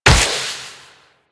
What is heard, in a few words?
Wall Run Step; Warframe; Wraith